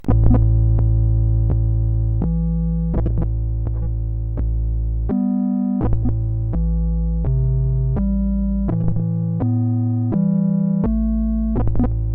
sid CSG 8580

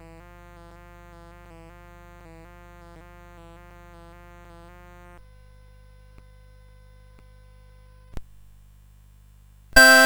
Sheep may Safely Graze, CSG8580

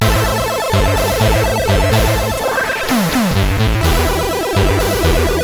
X-Music Remix, CSG8580

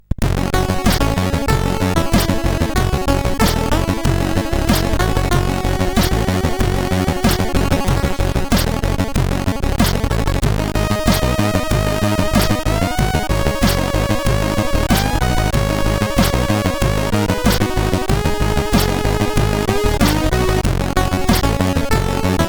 Rabbit Land, CSG8580R5

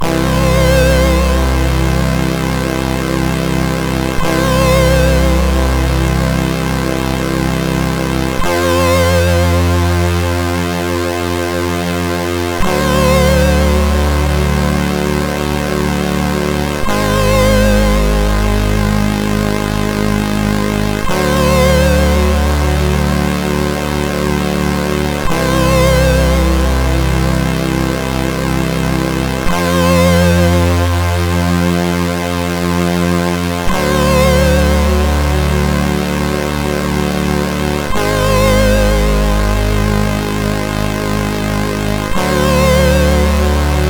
E-Load loader, MOS6581R4; hvsc

